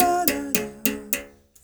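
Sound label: HI BERIM GRV, BERIMBAU; Spectrasonics; Heart of Africa 2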